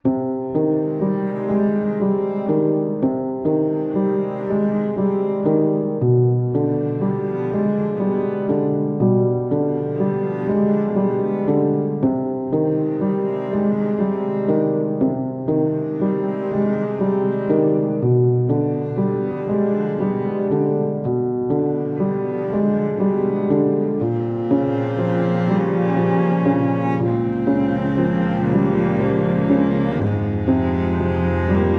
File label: The Third Mirror Underscore, Classical Cinematic